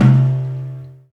DAIKO, World Dance; Spectrasonics; Supreme Beats

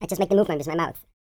YODEL YELL, World Voices

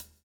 swing

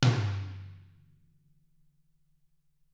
Toms; Bob Clearmountain Drums I

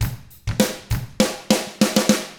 ROCK, Drums; ILIO; Double Platinum Drums 1

